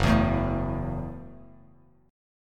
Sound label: F sharp Minor 7th F sharp, Bass Standard; strummed; JGuitar